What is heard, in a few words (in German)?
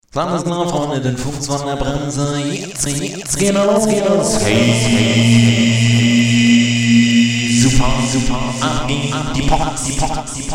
Jingels